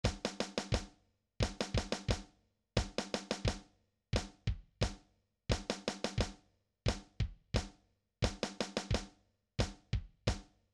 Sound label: drums; Top Drawer